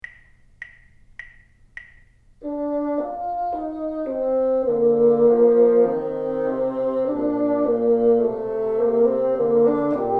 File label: together, bassoon